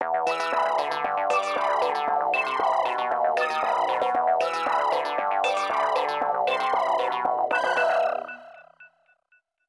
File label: cheesy synth riff